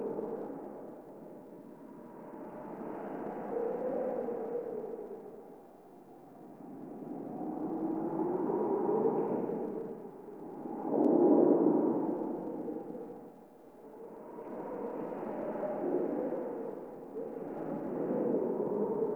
Interior Bed Amb Loop, Ambience; Warframe; Awakening Remaster

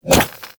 Infested Throwing Star Adhere; Warframe; Infested Throwing Stars

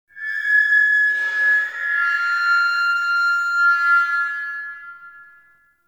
Interior Stadium Feedback; Warframe; Ambience; Nineteen Ninety Nine

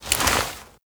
Tenno Fall From Cryopod Foley; Warframe; Tutorial; Cinematics

